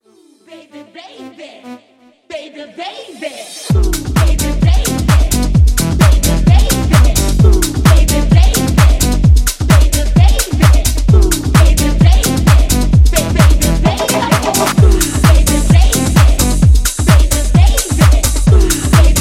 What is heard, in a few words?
Tech House Intro; Remixfreakz